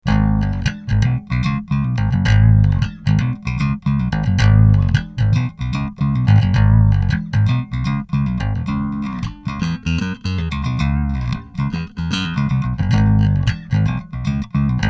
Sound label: riff